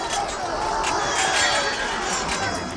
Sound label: battle